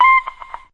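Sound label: guitar plucking; instruments single samples; guitars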